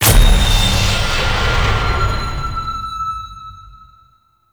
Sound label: Resource Recovered; Warframe; Abyss Of Dagath